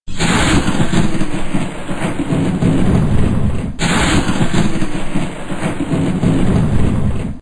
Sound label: thunder